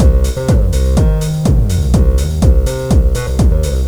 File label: drumloop; awe32